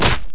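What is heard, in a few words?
chicken land; Screen Wreckers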